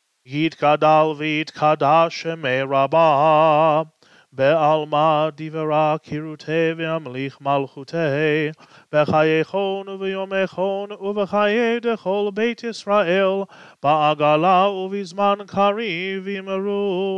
Chatzi Kaddish weekday gypsy mode; Learn To Lead; Weekday Services; Shacharit

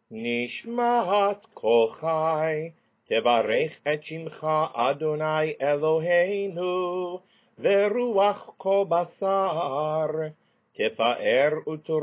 NISHMAT

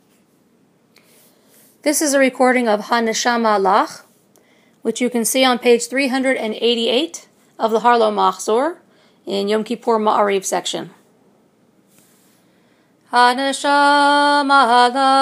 Haneshamah Lakh

